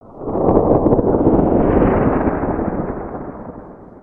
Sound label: torain